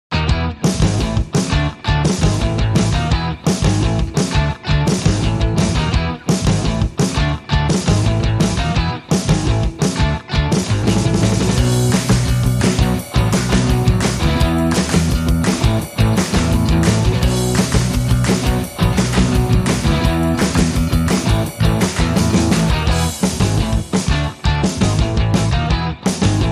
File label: No Lead Guitar